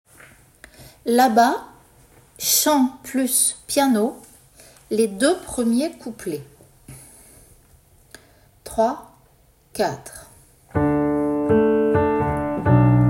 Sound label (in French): chant Piano